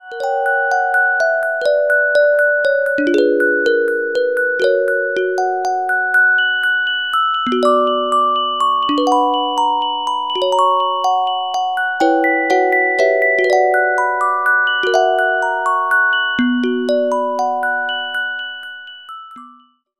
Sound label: Carillon Music Box Melodies